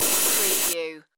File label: digital data glitch